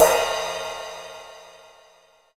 Cymbal Sample D# Key, Royality free cymbal tuned to the D# note. Loudest frequency: 2758Hz